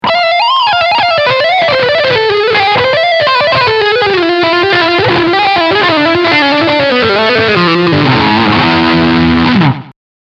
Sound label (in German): Lick beide Pickups